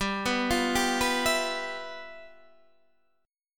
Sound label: G 13th G, chord